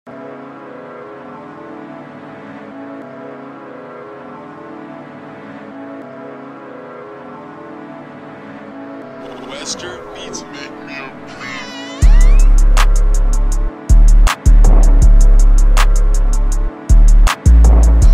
Type Beat; Prod. Wxsterr

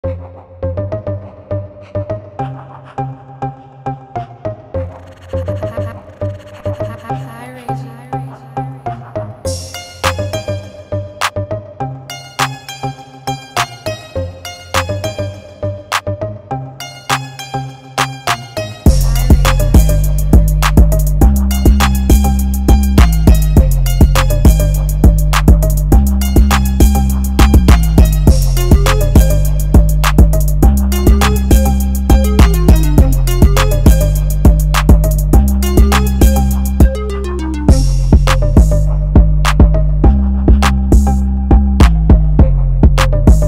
type beat

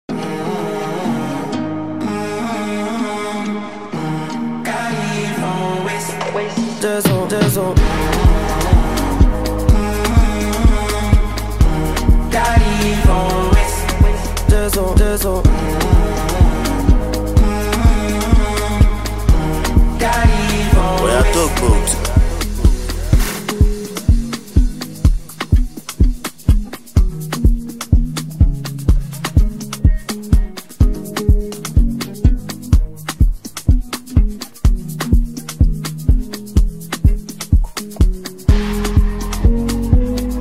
OPEN VERSE Instrumental BEAT HOOK

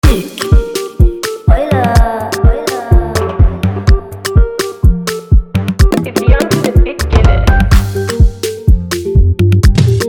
Beat; Prod. By Everyoungzy

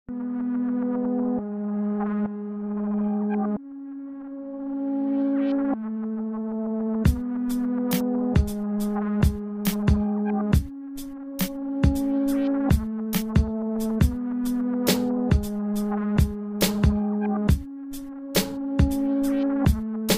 Type Beat RB Lofi rnb Instrumental

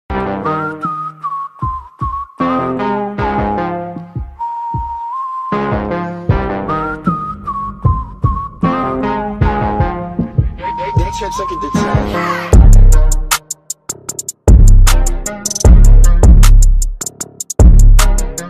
Instrumental

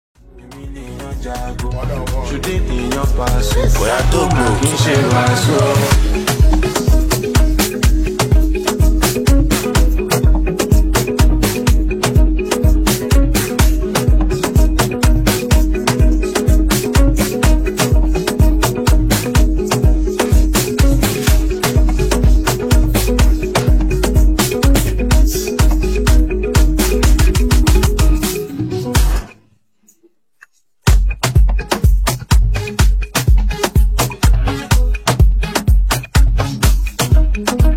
OPEN VERSE Instrumental BEAT HOOK

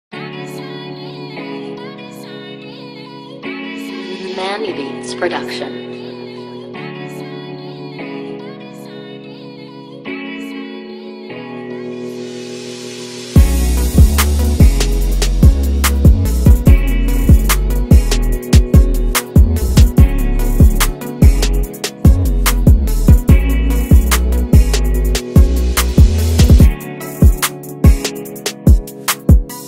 Type Beat Trap Beat Instrumental

